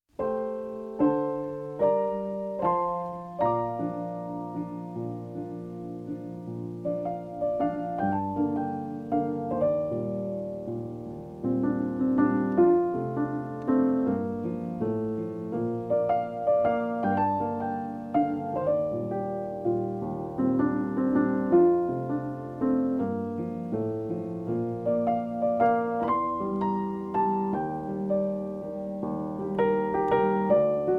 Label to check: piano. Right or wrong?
Right